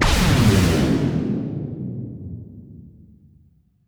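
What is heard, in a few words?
DEPTH CHARGE, Impacts, Hits & Booms; Distored Reality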